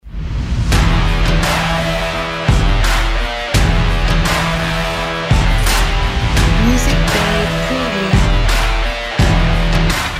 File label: Hip Hop and Rock Cinematic Trailer